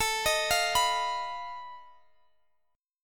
B 7th Flat 5th A, guitar chord

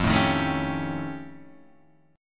F Augmented 9th, Guitar, Drop D

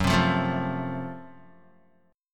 Gb Minor Major 7th Flat 5th, Guitar, chord; strummed; 2,3,3,2,5,x; JGuitar

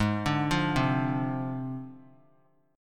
Ab 6th Flat 5th Ab; arpeggiated; JGuitar

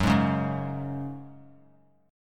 Gb Suspended 2nd, 3C,3F,3A,3D; Gbsus2 strummed

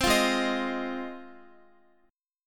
Ab 6th Ab, Guitar; x,x,10,10,8,10; Ab6 strummed

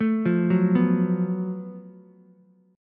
F sharp Minor Major 7th Double Flat 5th, Ukulele; arpeggiated

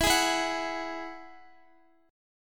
E Major Add 9th, Guitar; x,x,16,13,16,14; 4F,4A,5C,5G,5C,6E; strummed

